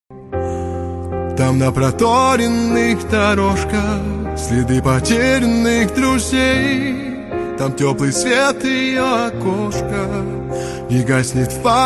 piano version

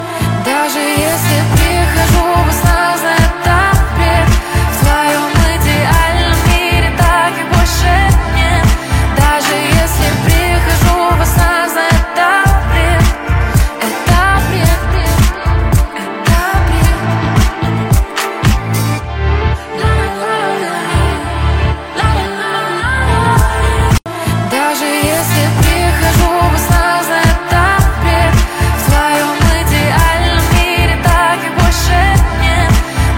polka

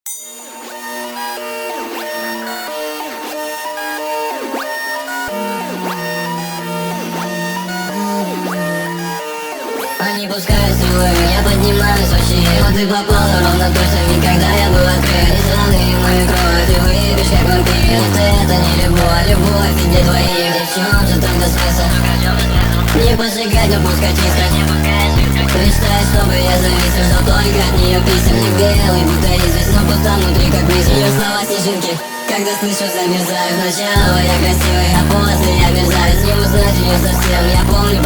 sped up reverb; speed up

